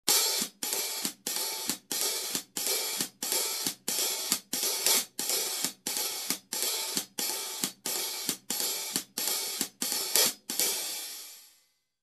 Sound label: Jazz Hat Groove